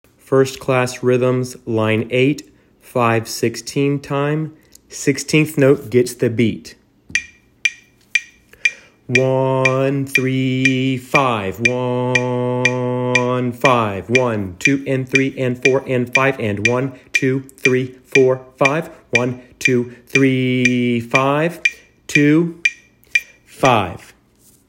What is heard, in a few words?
rhythms